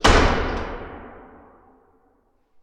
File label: DOOR Sci-Fi Metal Slam & Lock Reverb; mono; Universal Sound FX; DOORS & GATES